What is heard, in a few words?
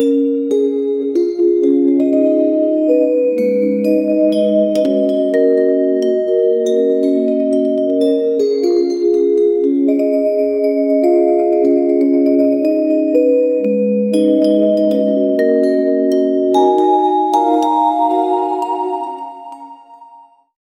chilling but melancholiac, melancholy